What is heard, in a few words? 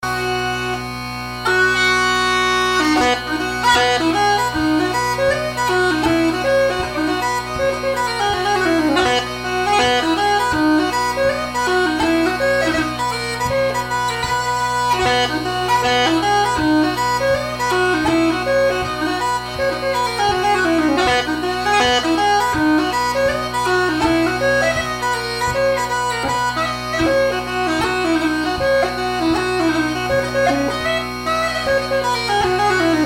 Hornpipe